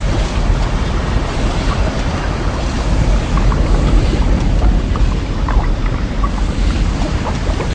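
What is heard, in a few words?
ambience lava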